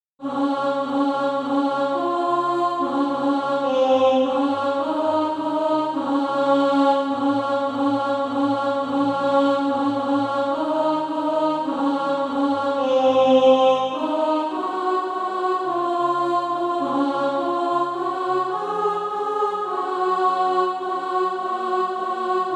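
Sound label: alto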